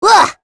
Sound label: Vox Damage; Sonia; kings raid; en